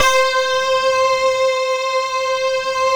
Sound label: SQ1, Synthesizers